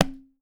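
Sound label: INDIAN MIRD, ETHNIC SET; Voice Crystal - Steve Reid Definitive Percussion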